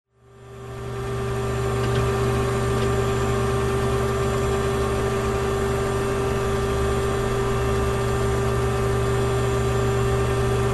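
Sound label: office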